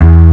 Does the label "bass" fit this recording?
Yes